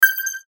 CHIME SQUEAK, Chime Squeak Warble; Chime Squeak Warble Production Element Imaging Element Accent Transition; Multimedia; Blastwave

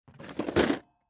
Drawer Open Plastic Filing Cabinet; Drawer Open Plastic Filing Cabinet Storage Bin; Office; Blastwave

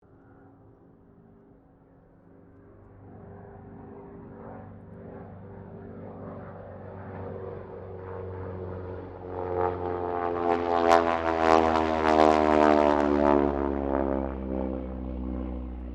AIRCRAFT AT-6D HARVARD MK III LOOP; Temora Harvard MK III; Airplanes; Vehicles; Airplane Sound Effects

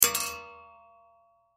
Bullet Shell; Bullet Shell 38 Special Drop Metal Catwalk; Warfare; Blastwave

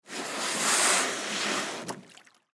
Canoe Drag In Water; Transportation Watercraft; Dragging Sound Effects; Dragging (Sound Effects:Objects); Blastwave

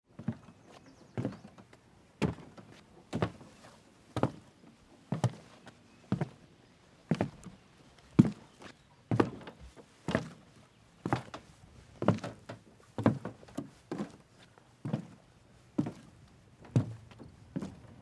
Footsteps Dock Walk Slow Distant Birds; Human

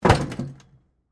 Sports, Bowling, Ball, Hit, Miss, Pins; Bowling (Sound Effects:Sports); SoundEffects-BlastWave

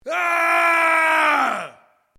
MALE ROAR AGGRESSIVE SHORT; male vocalisations; Yelling; Voice

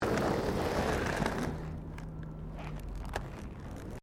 ROLLERBLADING BITUMEN SMOOTH STOP; Sports

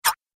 Arcade Game Fast Thin Slice; Video Game Sound Effects; Retro Inspired Sound Effects - Think Nintendo, Atari, C64, Etc.; Andrew Fleming

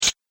Android Robotic Click; Robot Sound Effects

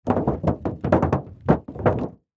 Impact Automobile Hood, Buckle; Crashes; BlastWave

